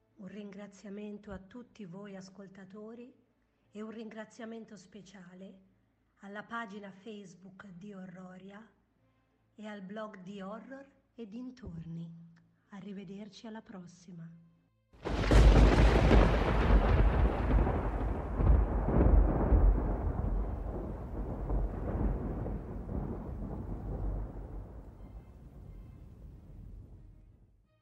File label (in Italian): cavallo